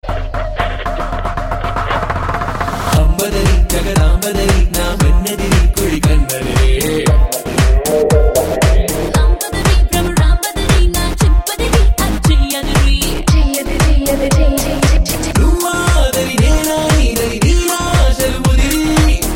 Remix Ringtone

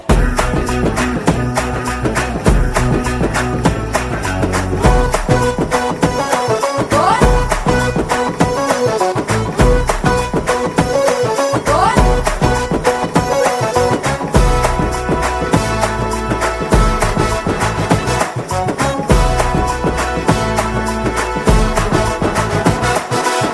Drum Beats

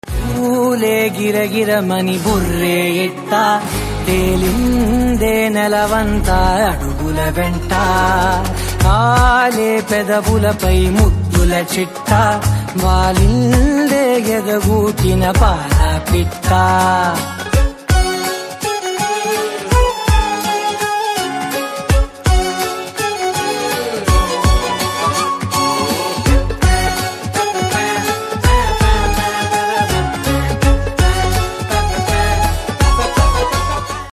BulBul Tarang Ringtone

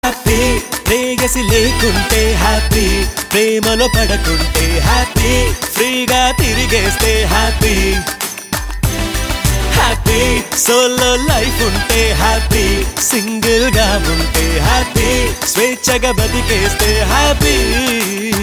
Happy Solo Ringtone

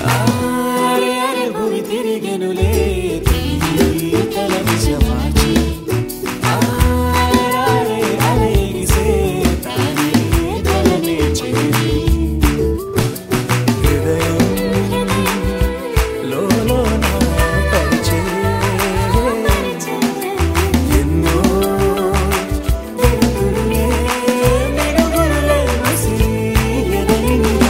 Ringtone

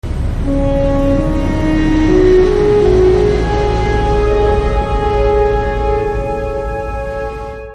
Trailer BGM Ringtone; Mission Mangal

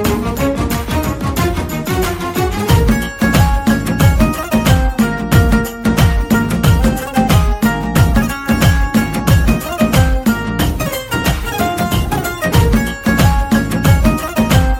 Instrumental Tone

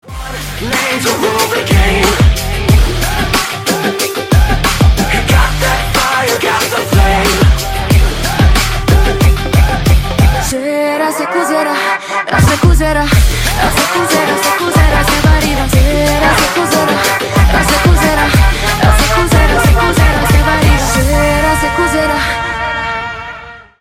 Trailer Glimpse BGM; Jailer 2

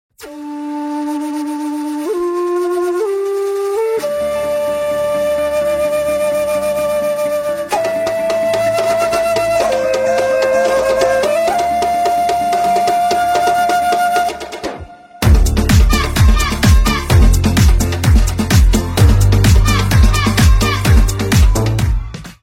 BGM Ringtone

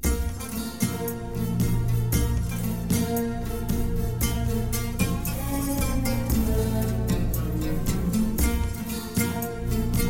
Sad Theme Ringtone

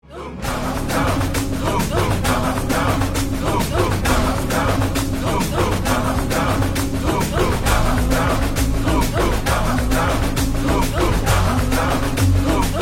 BGM Theme Ringtone

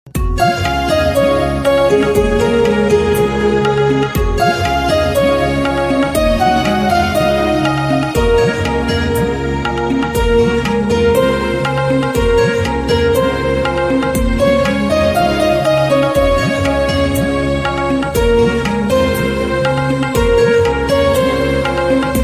Intrumental BGM

